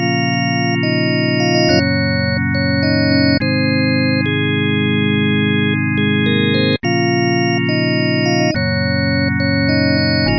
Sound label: organ, yamaha tx81Z; fellowship